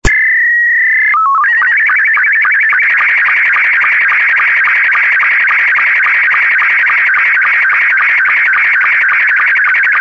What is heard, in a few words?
sstv scottie s2